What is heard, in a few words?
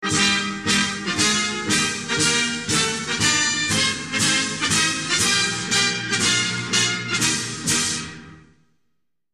win jingle; Italy